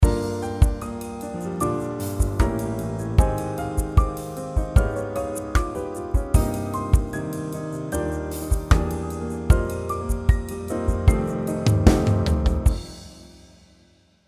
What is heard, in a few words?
harm variation; develop your musical ideas